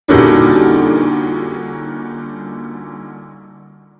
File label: Piano Scare; 1916 der unbekannte krieg gamerip